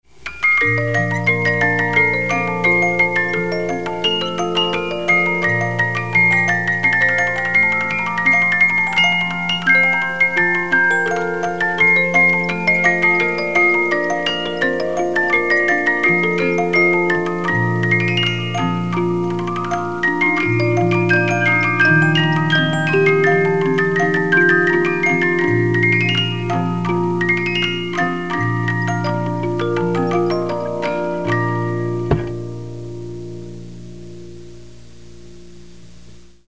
The Magic Flute Vogelfanger, music box 72-Note

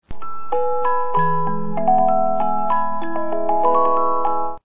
Brahms Waltz Op 39, 50 Note music box

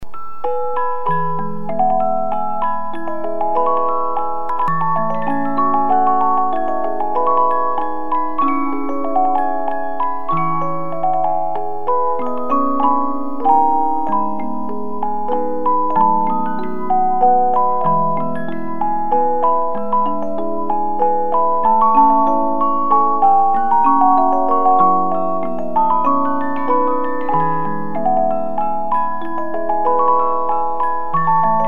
Waltz Op 39, 50-Note Movements; Brahms

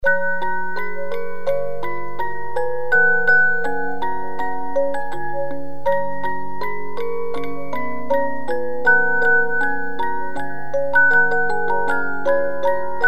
Symphony No 5, 50-Note Movements; Beethovens

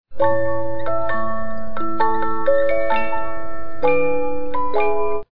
Eine Kleine Nachtmusik Allegro, 50 Note music box

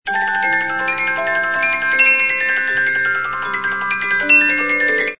The Trout Variation, 72-Note Movements

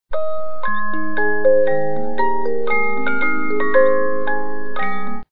The Magic Flute Ein Madchen, 50-Note Movements

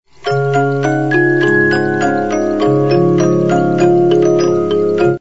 9th Symphony, music box 72-Note

